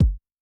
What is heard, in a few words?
Ana Quick, Kick, Yamaha DTXpress-II